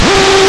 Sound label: door